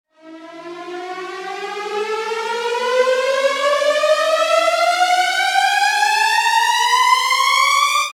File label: Swet Builds Short, Tention Makers; RoosterFX